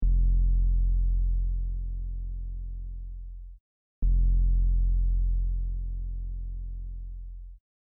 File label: sabbass serum